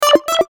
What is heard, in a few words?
Logo Video Game Beep Ascend; Sonic Logo; Blastwave